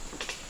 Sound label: C diana, dianas